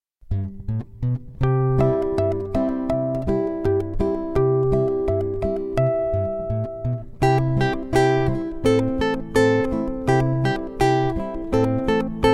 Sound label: Light Rain Duet